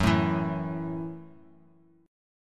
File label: Gb Minor 7th down; strummed; JGuitar